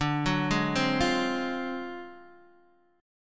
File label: D 7th Sharp 9th Flat 5th D down Guitar Open D